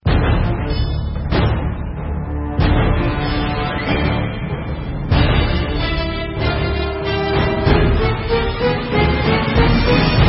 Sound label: hi action